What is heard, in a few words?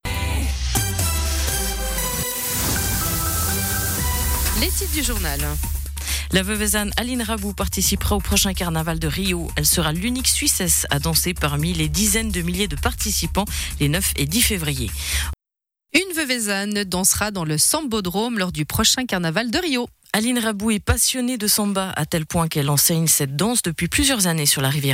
Samba; Radiochablais